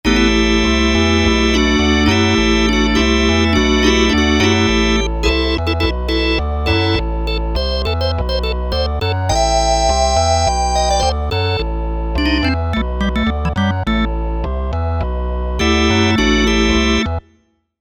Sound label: Vox Organ